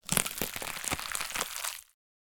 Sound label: horror flesh guts movement; sound effects one